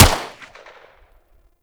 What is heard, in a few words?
Pistol Shot D; Warframe; Tenno; Tenno Harlequin Pistol